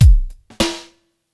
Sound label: drbreak, drumbreaks; Masterbits; Vinyl Attack